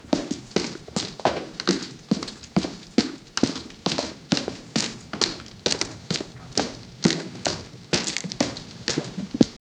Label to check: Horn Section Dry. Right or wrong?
Wrong